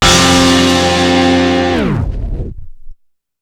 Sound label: GTR SHOT; BEAT2000